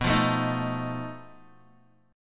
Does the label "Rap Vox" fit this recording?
No